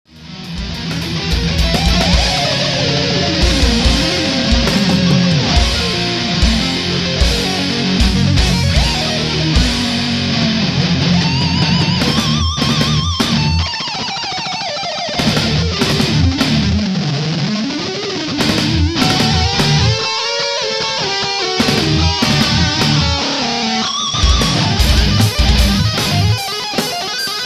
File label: solo section